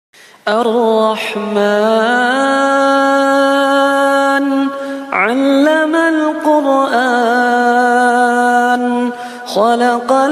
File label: most beautiful islamic ringtone; Sura Ar Rahman